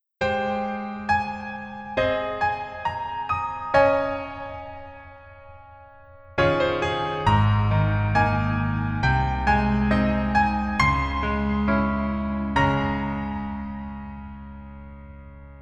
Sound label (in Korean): Piano ver, 멜로디MR; 하이바이, 마마